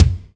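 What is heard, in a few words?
BD, bass drum; Best Service Gigapack 2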